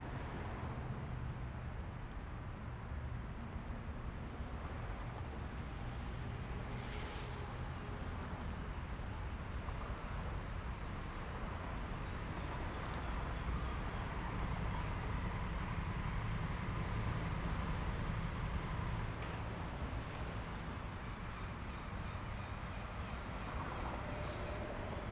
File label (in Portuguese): Transito de dia com passaros passa bicicleta